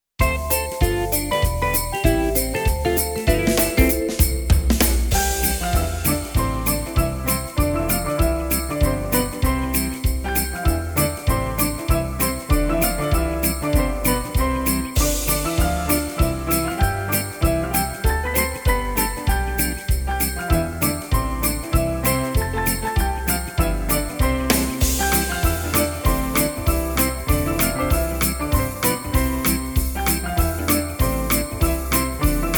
quickstep

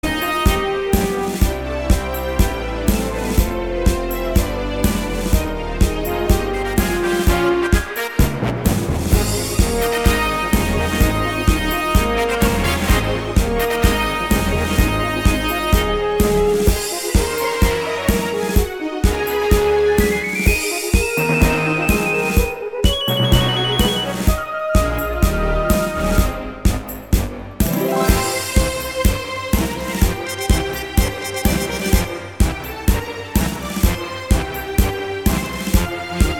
thunderbirds tango, ballroom

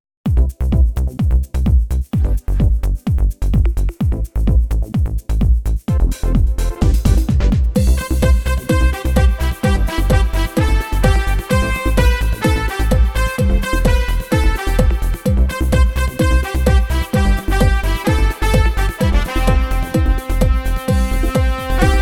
CHA CHA

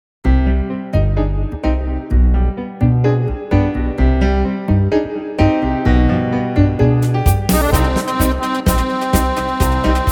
TANGO

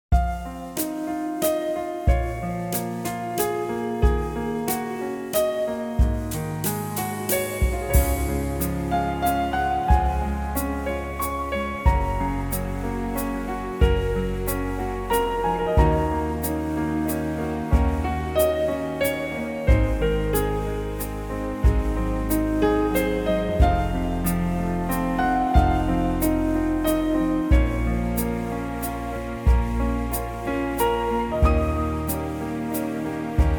one song waltz

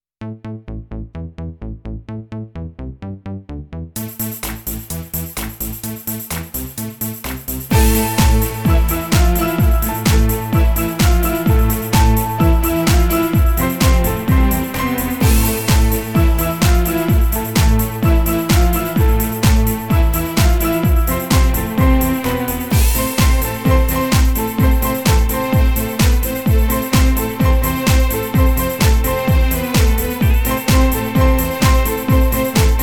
tango

